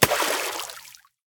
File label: kappa projectile; smite; kuzenbo